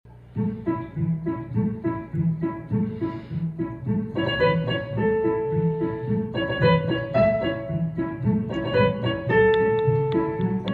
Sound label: Piano Pizzicatto; Winnie Puh Music